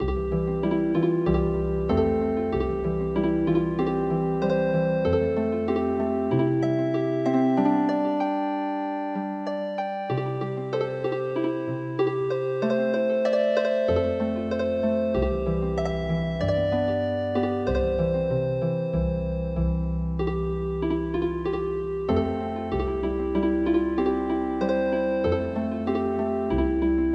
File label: spring season has come Healing Harp